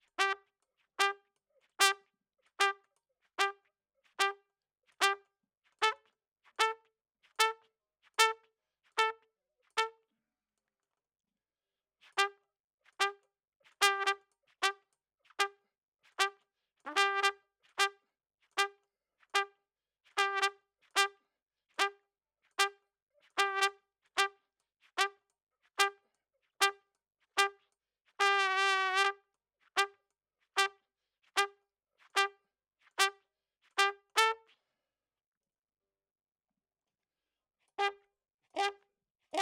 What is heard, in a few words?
Trump